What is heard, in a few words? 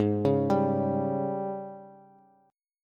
Ab Major 7th Suspended 4th Ab down Bass; arpeggiated; JGuitar